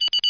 charge countdown; MOTS

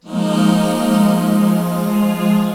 Synt; FUNKIN&GROOVIN'

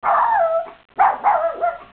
Small Dog; HyperStudio; HS Sounds

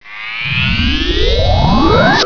Sound close

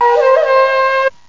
okinawa flute1; Octamed 6; walkabout; far east

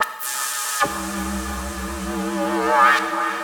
Synt; Evolution 078

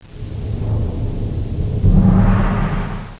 turn

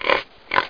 pig

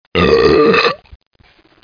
belch

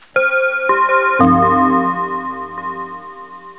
DEATH; hamster

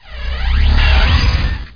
blink in; Hexen II; skullwiz

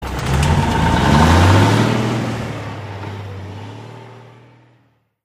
delivery truck; brain spa 03

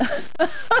laugh

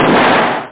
Long Expl; suicide mission

